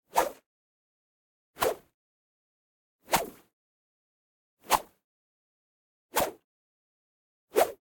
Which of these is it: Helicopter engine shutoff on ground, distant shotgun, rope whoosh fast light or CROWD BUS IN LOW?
rope whoosh fast light